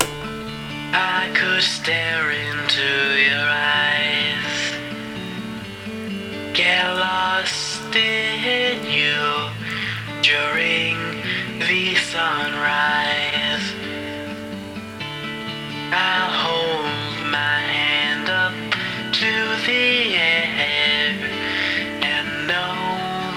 Acoustic; lolicore

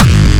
kickdrum; Kick Drums; Unfinished-Catastrophe; lolicore

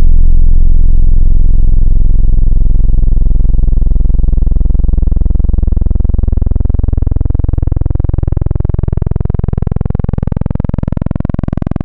Analog Circle PWM; Synthetic's Serum Collection; Echo Sound Works Core Tables

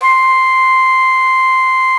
Noises Flute; Synthetic's Serum Collection; Production Master - Vital Future Bass 4

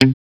Creature Guitar C2; Aubit; Awake Vol. 1 Serum Noises

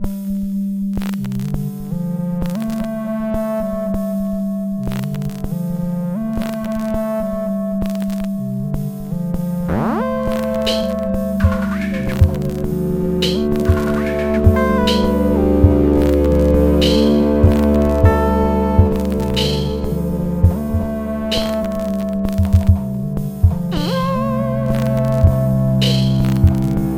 noisy analog; lolicore

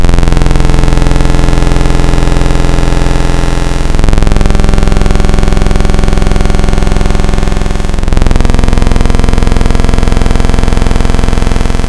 step ladder; Synthetic's Serum Collection; Echo Sound Works - Modular

